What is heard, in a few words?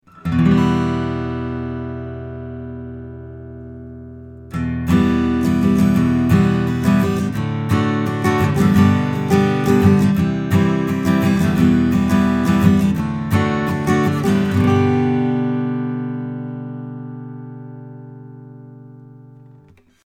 A Guitar Original